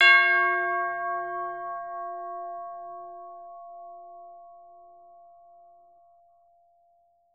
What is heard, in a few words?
TBL LG C#5, orchestra; Best Service; Peter Siedlaczek's Advanced Orchestra